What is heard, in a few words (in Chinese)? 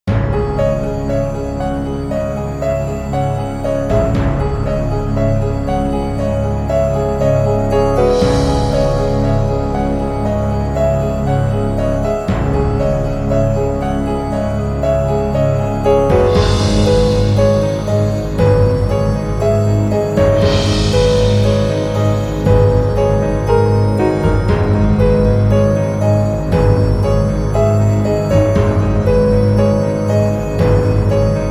等待; 配乐类音效